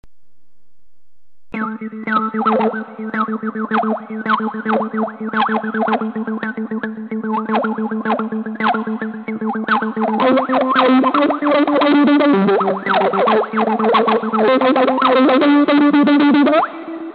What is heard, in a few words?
Single String Wobble Tube Zipper